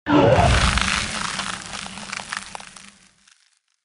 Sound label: Sfx Enemy Dragon Attack